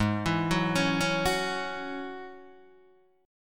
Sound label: G sharp 7th Suspended 4th Sharp 5th G sharp, Guitar Open D